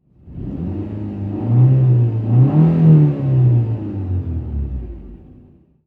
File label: Transportation Cars Honda Civic Rev Engine Multiple